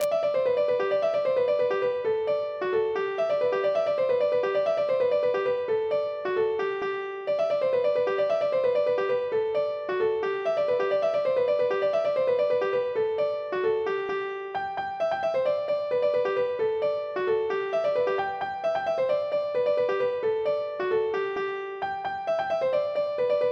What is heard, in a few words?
polka; boeuf trad